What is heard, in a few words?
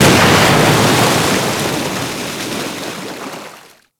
Ice Metal Debris Fall; Warframe; Corpus